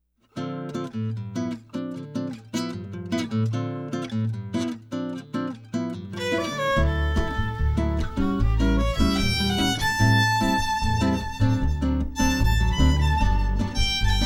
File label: violin guitar cello